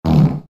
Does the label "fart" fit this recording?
Yes